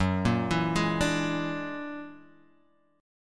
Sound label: Gb Augmented 9th, Guitar Half Step Down